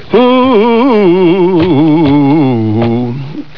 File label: tom chop